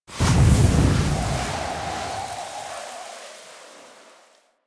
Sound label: Weather Activate; Enemies; Abilities; Warframe; Corpus; Arachnoid Camper Terra